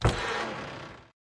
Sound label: Open Door Wooden; Lethal Company